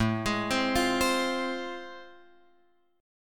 A Minor Major 7th Sharp 5th; AmM7#5 Chord {5 X 6 5 6 8} arpeggiated

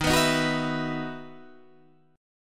E Major 7th Suspended 2nd Eb

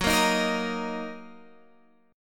Fsharp 6th down Guitar Open F; F#6; strummed

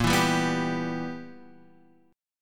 B Major 7th Suspended 4th Sharp 5th Bb

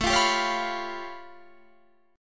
A Augmented 9th A, Guitar Standard; strummed; 17,20,17,18,18,19; JGuitar

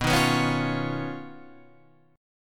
Eb 7th Suspended 2nd Sharp 5th, B

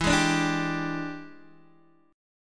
E 7th Sharp 9th Guitar Open D, chord

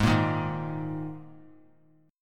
Ab Suspended 2nd; strummed; JGuitar

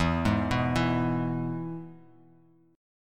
E-Major E; arpeggiated; JGuitar

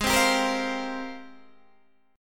G sharp Major 13th, Guitar, DADGAD

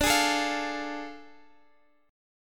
A Major 7th Suspended 4th Sharp 5th

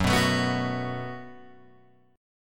Gb Minor Double Flat 5th, Guitar Open G

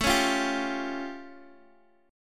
A Augmented 7th Guitar Open G; chord

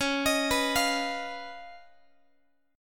Db 9th Db down Guitar; JGuitar

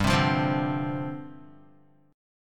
G 7th Suspended 4th G down Guitar; G7sus4 strummed

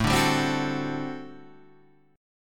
G Major 7th Suspended 2nd Suspended 4th A

